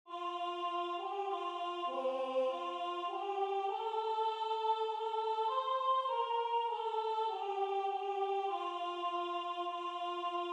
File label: Soprano